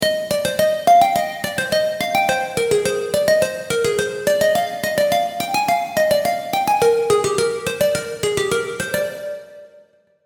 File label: serum plucks; chiqui audio